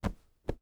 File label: Cloth Fluff Pillow; household